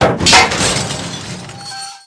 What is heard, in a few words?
metal lamppost creak; World of Tanks Blitz